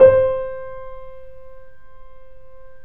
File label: C, The Piano; InVision Interactive - The Piano; BOESINGSOFT